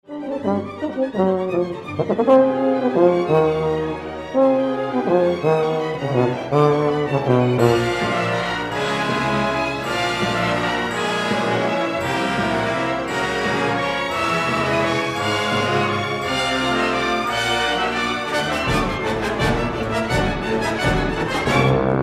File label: Tuba Concerto; Vaughan Williams